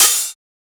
Open Hihat, Techno Drum Shots; Drum One-Shots; GhostHack; Ghosthack - Free Techno Drum Shots 2024

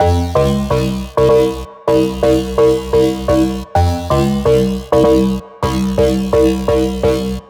G House Drop Loop - 128 BPM F Min; Synths

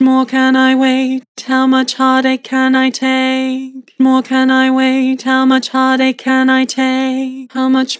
Vibrato, Vocals; How much more can I wait; Harmor Vocals

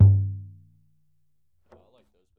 Frame Drum - G; percussion; Cymatics - Infinity Beta Pack 2.0; Zeus Percussion Collection

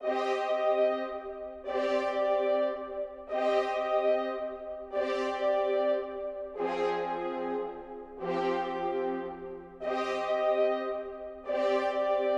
147 BPM C Min Brass; Melodies; Loop Stems; Cymatics; Saga; PHARAOH Beta Pack; Pharaoh Premium Drum Samples (BETA)

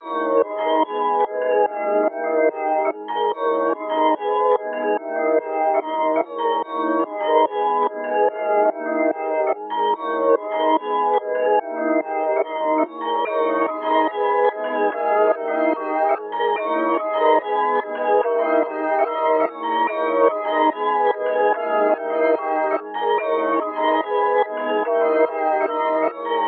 145 BPM G# Min, Melody, Trap; Cymatics; 2022 Melody Collection; Trap Pt 2

